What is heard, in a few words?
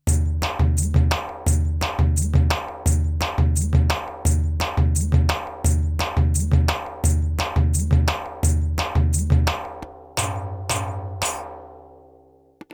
Groove; Swar